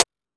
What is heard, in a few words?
Techno D; EMU XL-7